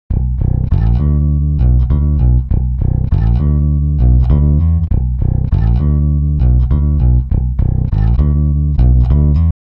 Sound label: bass; ikm sample tank ps ts